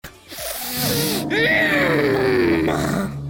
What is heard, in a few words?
sniffa